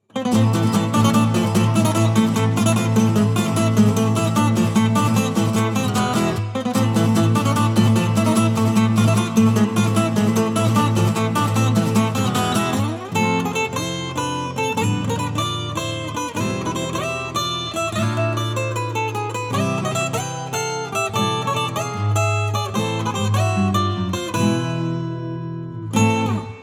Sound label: Circus Tent Acoustic Short